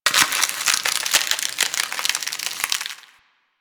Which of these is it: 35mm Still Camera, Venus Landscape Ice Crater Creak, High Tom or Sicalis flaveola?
Venus Landscape Ice Crater Creak